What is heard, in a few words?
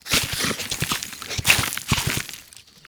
Juggernaut Truffle Dig Foley; Warframe; Infested; Truffle Hunt